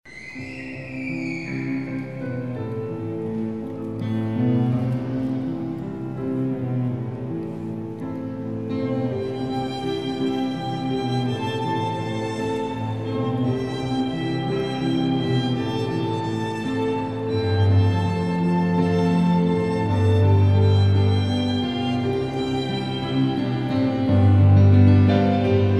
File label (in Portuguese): Piano e Cordas